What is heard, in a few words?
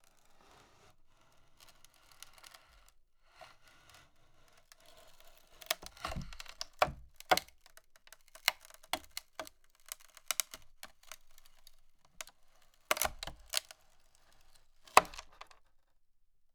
desolated strings & wood wood creak break; The Soundcatcher; Desolated Strings and Wood